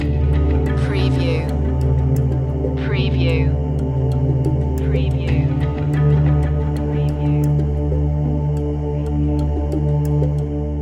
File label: wild tracks loop ending